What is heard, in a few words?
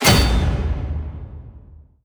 bell; wesnoth; orc shaman